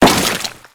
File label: Crewman Step Goo, Footsteps; Warframe; Corpus